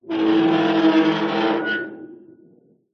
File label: metal huge heavy scrape screech; foley